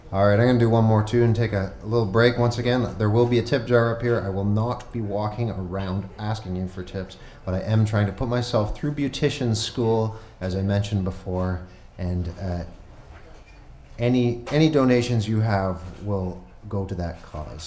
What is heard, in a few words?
one more tune tip jar slurf song intro